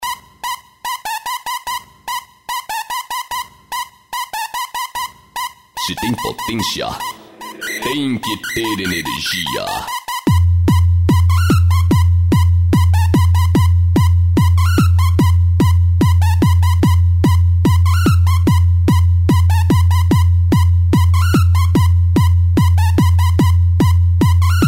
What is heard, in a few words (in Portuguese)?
Baterias, som automotivo; Baterias Agressive Power Vol.02; som automotivo raiz; Open Format